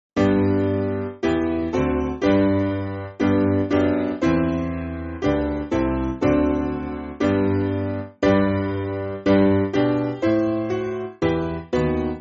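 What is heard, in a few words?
Piano, Free Music Backing Files for Churches; Blessing And Honour; O Quanta Qualia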